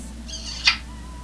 kiss

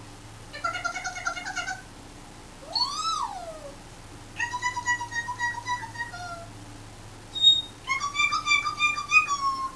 tickle tickle

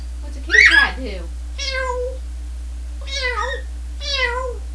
kitty cat

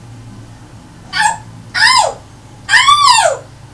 owww

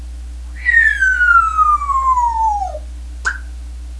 bombdrop

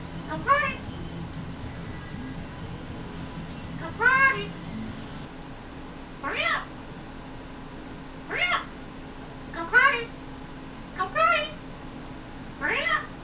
go potty hurry up

